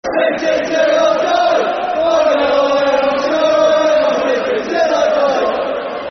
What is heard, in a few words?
City Till I Die [Football Chant (Soccer Song)], Coventry; Coventry City Ccfc